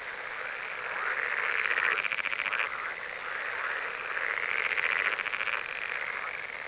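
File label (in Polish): rana esculenta, plazy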